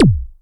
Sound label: BD Rave, Yamaha DTXpress Electric Kick